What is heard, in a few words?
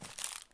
snack pickup; Escape from Tarkov